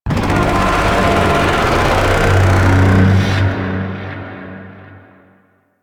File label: Void Dragon Vocal Idle; Enemies; Vocals; Warframe; Duviri